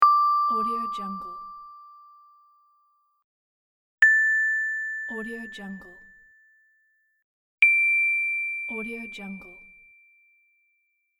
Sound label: game and interface ding pack; drtimwaters